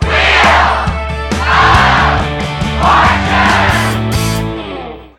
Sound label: Intro Short Music; Wheel of Fortune